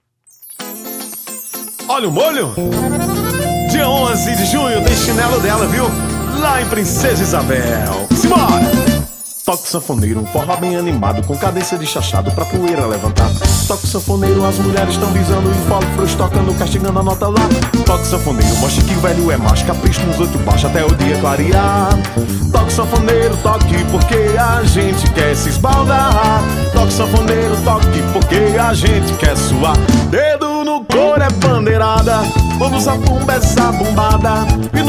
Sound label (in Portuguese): toque de fole